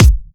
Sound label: Kicks; Vengeance Samples